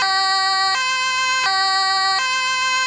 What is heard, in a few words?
motor